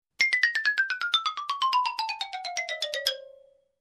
Xylophone cartoon falling sound effect long

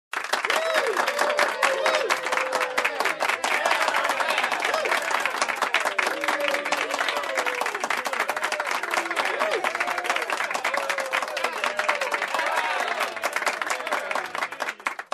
Crowd clapping and cheering

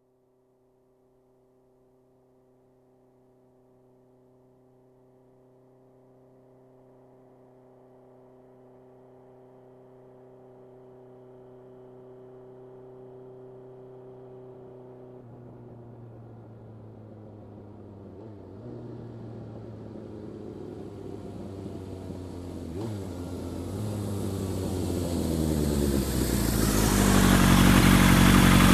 Motorcycle approaching and parking

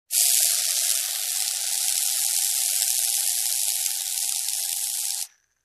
Self-Pouring Vending Machine

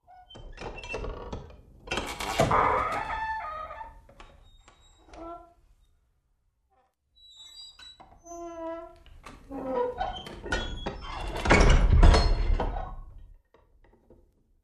Truck Creaky Back Doors open close

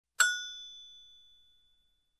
Cheap Bell Ring

